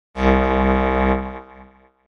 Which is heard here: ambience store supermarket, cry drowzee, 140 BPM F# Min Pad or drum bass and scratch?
drum bass and scratch